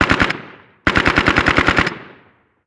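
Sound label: gun ak47 distant; ModernWarfare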